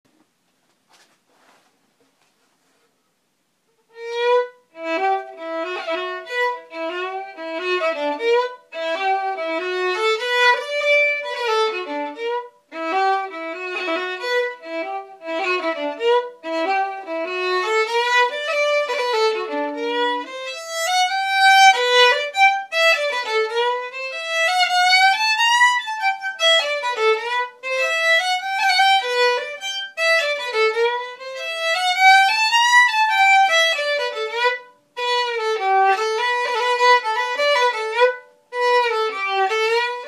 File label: Butterfly slip jig